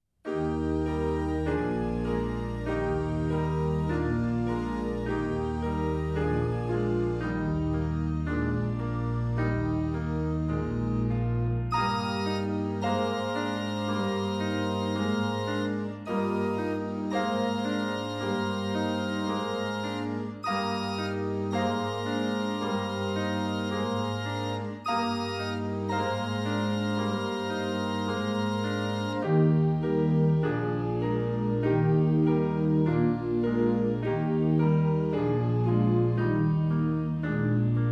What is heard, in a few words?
SDA HYMNAL SONGS TUNE